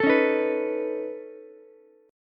C sharp 7th Suspended 2nd Sharp 5th, C sharp, down, Ukulele; strummed; chord